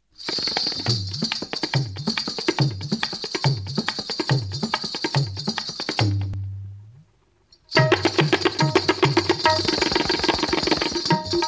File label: Dance Music; Chhota Aadmi